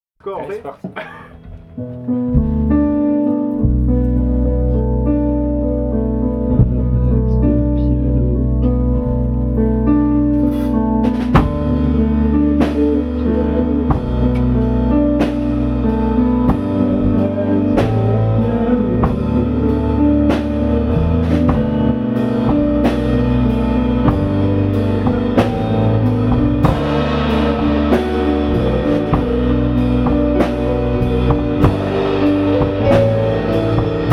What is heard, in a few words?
piano; tribute